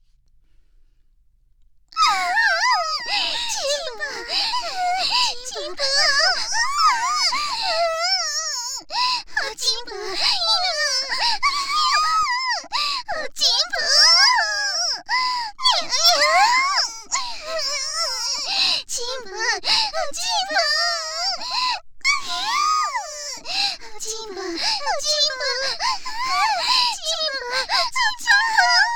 喘ぎ, ASMR; おチ〇ポ喘ぎ

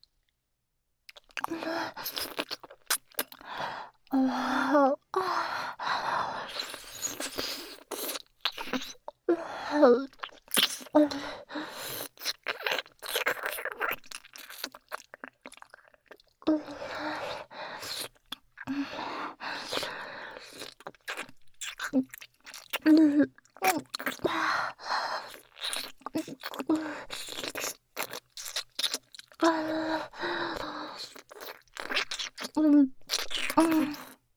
絡み合うキス

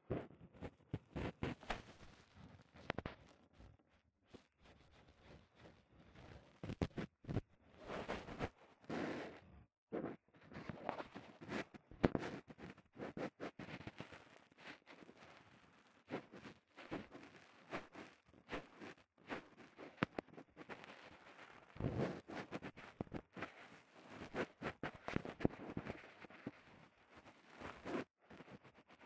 綿棒 奥 L, 耳かき; 3DIO; 耳かきに関する音

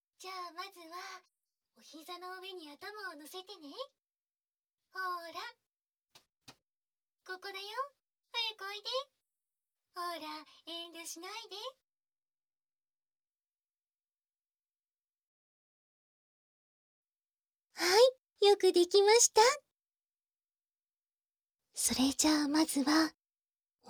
癒しの耳かき; SE無し

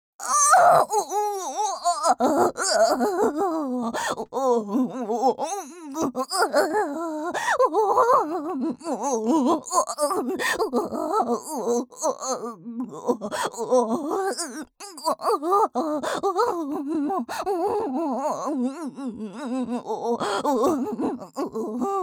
喘ぎ声

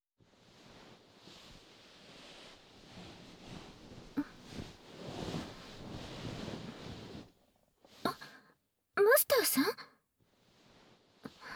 介抱耳かき＆洗体, ASMR; シスター

